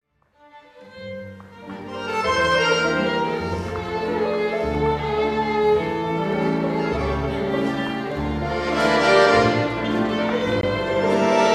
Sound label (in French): Les 2 guitares